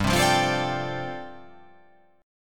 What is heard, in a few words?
G 9th Suspended 4th